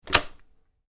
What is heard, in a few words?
Phone: Domestic Handset, Pickup; Telephones; Electronics; Stuart Duffield